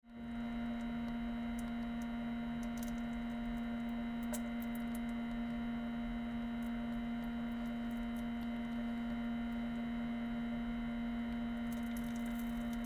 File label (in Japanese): ミチ音ポチャ音（声なし）